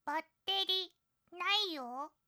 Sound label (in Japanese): バッテリ切れアラーム